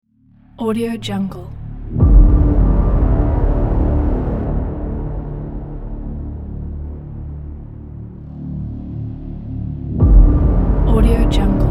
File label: epic action trailer